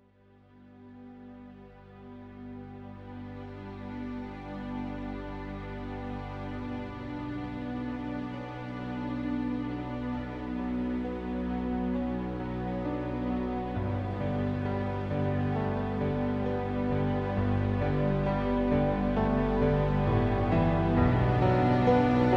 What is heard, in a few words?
(Cramp Piano Mix)